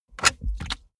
car bmw e46 interior drink holder take bottle hard